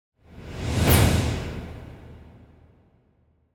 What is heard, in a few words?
Short Finale - Single - Increasing; Hollywood Edge; sound designer's toolkit v2